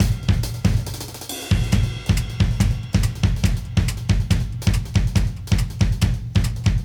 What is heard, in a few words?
Modern Breaks; Touch Loops; ClassicBreakSessions